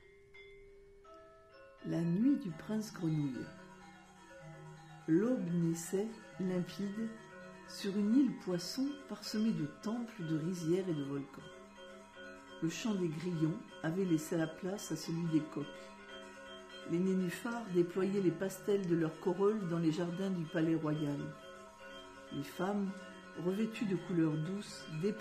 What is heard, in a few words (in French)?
musique